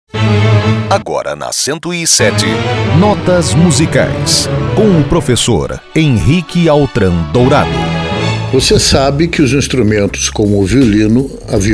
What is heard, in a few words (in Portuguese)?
Notas Musicais Arco